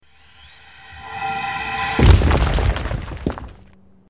Crash landing

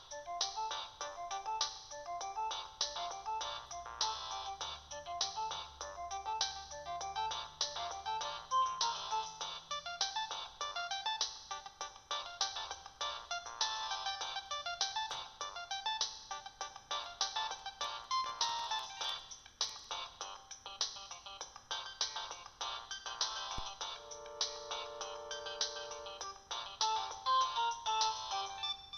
Jig

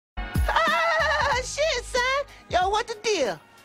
Shorty Laugh

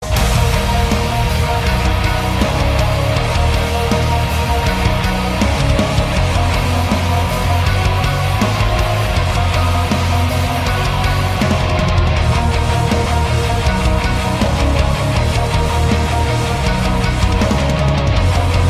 Metal Cover

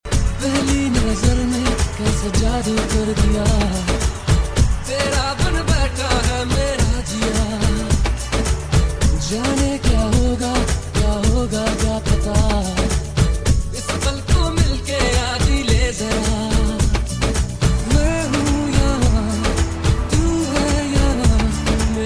Dholmix

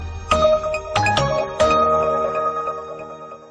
Samsung Led Sms

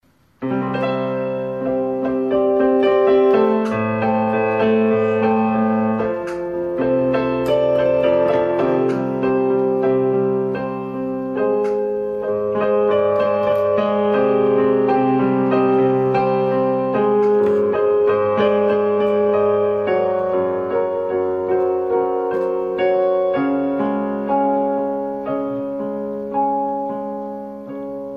Piano improvisation

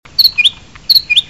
Bird Sweet N Short

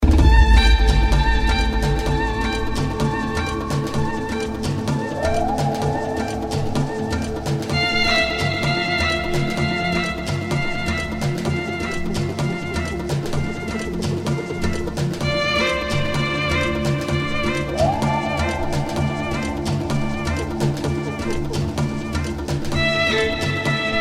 Tribal

